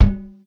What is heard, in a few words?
bubble launch; Geschicklichkeit; AquaBubble2